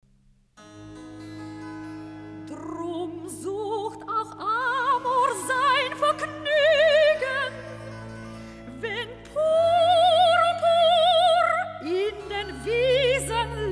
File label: Recitative Drum sucht auch Amor; Cantatas; Bach; Famous Cantatas II